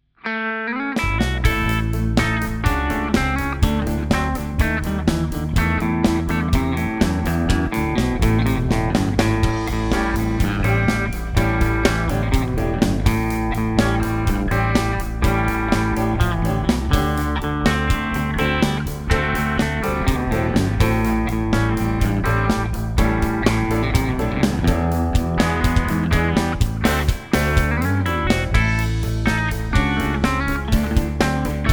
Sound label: Country Song